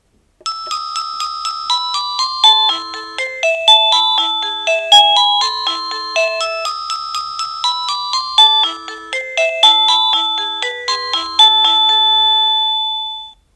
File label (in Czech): melodie